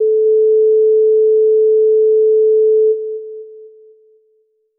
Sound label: SINEWAVE; rekordbox; OSC SAMPLER; PRESET ONESHOT